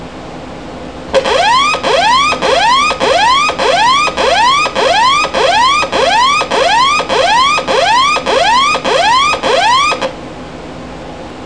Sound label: Missile Jettison